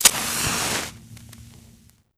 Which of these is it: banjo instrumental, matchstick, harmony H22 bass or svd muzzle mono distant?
matchstick